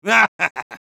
laugh evil, es; Risa malvada; Medic

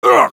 Sniper pain sharp

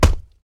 Taunt yeti chest hit; удар по груди 3; Насмешки снайпера

